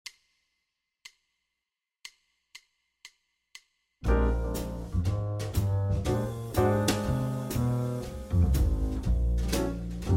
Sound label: Bb blues saxophone